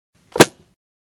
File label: Close the book; افکت صوتی